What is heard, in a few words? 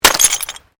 GLASS SHARD SMASH; Material components for constructing more complex sounds